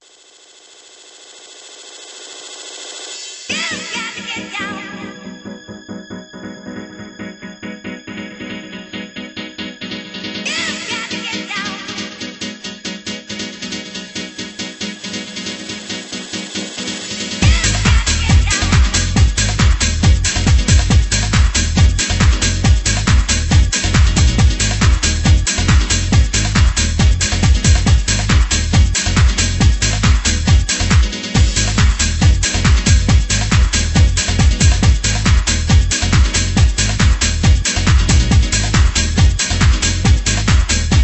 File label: Piano talk